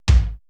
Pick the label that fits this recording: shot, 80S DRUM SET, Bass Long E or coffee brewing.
80S DRUM SET